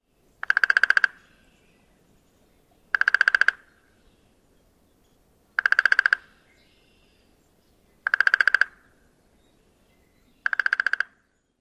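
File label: Downy Woodpecker drums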